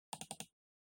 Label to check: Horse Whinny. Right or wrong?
Wrong